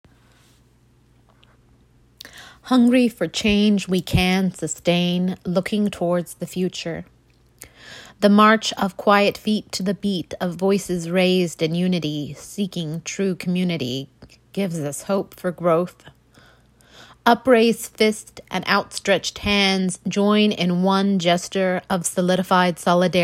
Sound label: sustain the beat